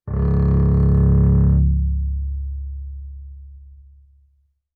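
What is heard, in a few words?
Bass arco fortissimo sul C Db1, Double Bass; MIS Pitches - 2014; Strings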